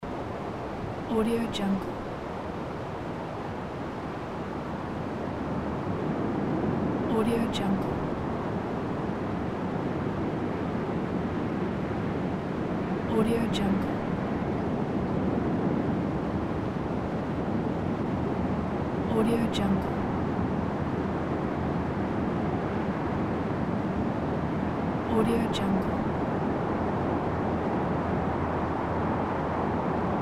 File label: wind